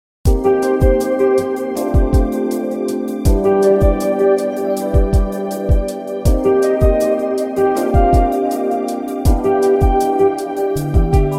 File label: Jazz, Whispers